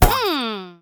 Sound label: Caramel Miss Sound; Jolly Battle; Android